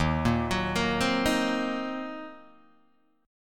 D sharp Minor 6th D sharp, down, Guitar, Open D

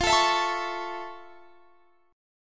F Augmented Major 7th F Guitar Drop D

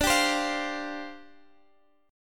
F Suspended 2nd D; Fsus2/D; strummed

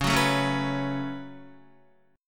Db 7th Suspended 4th Sharp 5th Db down Guitar

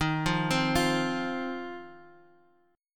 C Minor Double Flat 5th D sharp

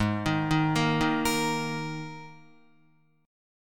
Ab Suspended 2nd Guitar; arpeggiated; JGuitar

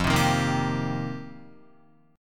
F 7th Suspended 2nd Suspended 4th, Guitar; F7sus2sus4; strummed; JGuitar

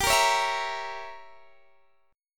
G Diminished 7th, Guitar, Open D

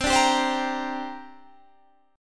C 7th Suspended 2nd C

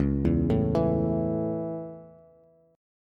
C sharp Minor Sharp 5th, Bass; arpeggiated; chord; JGuitar

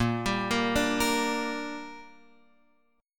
Bb 6th Bb, Guitar Open F; Bb6 arpeggiated

